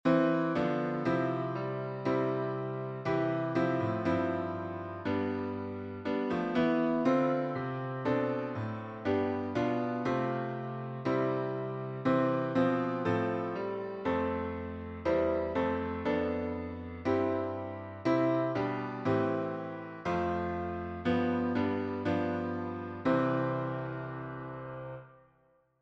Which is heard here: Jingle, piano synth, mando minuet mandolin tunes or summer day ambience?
piano synth